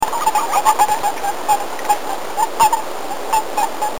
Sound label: bufos boreas, frog toad calls; amphibians